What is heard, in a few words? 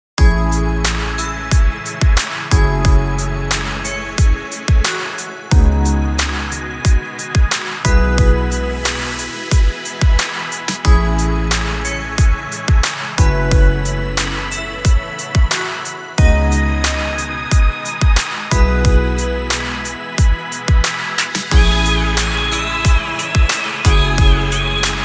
Ambient Hip-Hop Relax Music